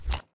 ui submenu units close

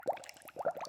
bubbles

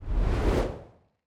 ui arena spell draw end

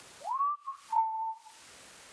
ambience room butchery whistle

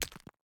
environment pickaxe hit stone

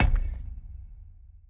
Super Heavy Object; TANK; 3Souls

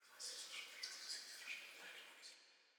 ambience room shadow room working layer

